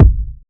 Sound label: dope steel kick drum a sharp key